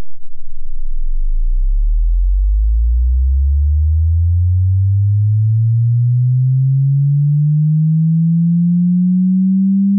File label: sine 0 200